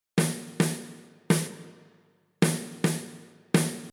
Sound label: snare comp EQ rev; masaakiwada